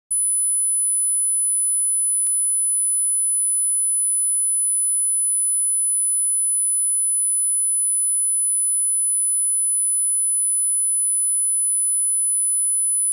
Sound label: 10000 Hz 10 dB left